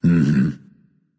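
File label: non verbal agree, voice; cernunnos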